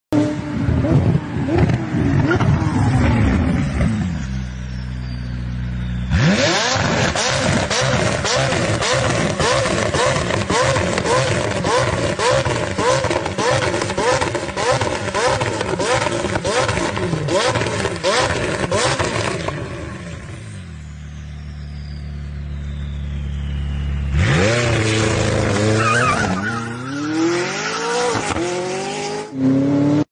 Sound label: McLaren